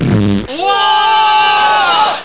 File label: BAD FART; valve